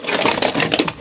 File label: recycle